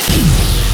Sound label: beam fire